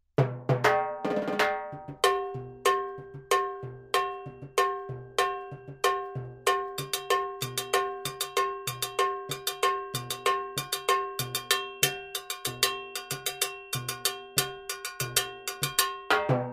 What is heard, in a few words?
LP cowbell